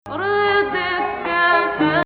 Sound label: Rast Nahawand